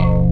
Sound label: plug bass; Pro-Rec - Synthsonic; TRINITY